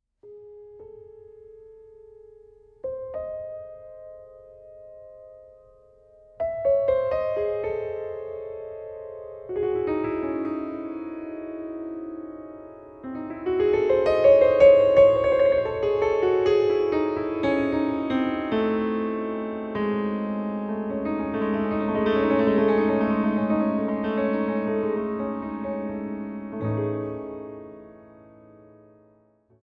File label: Piano; Golden Chariot